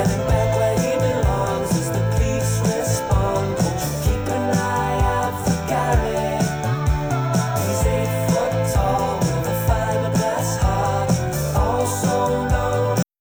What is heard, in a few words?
vocal only